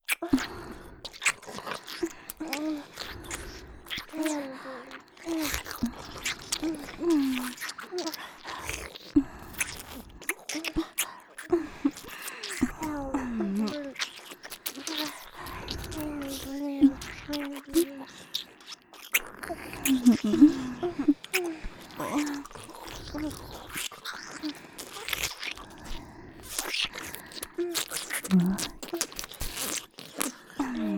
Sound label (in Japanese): ループ 左吐息＆右耳舐め＆ダブルフェラ; 人外姉妹のダブルフェラ～囁き声と耳舐めで脳みそトロトロになるまで嫐り犯してあげる～; 妹すたー